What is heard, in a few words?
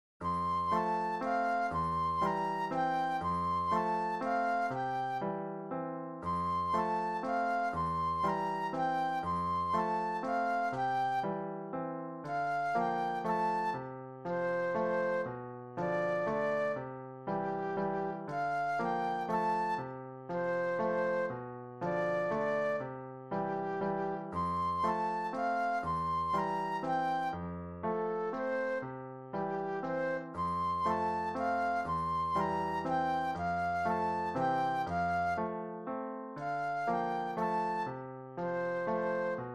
Flute Solo; classical